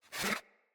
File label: Pet Alert Snort; Catbrow; Pet Ship